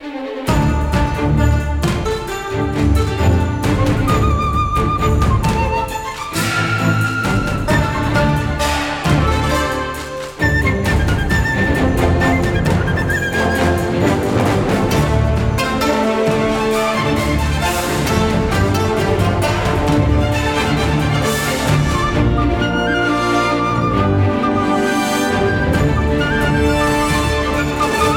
main music; Panda

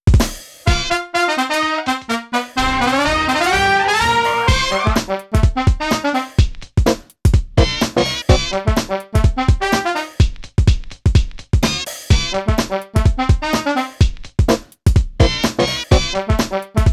Funky Stadium; Mario Kart Wii

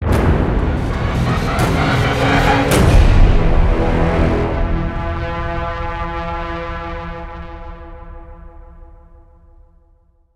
boss stinger; rogue stormers

